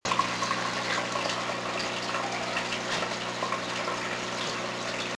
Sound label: noisy aquarium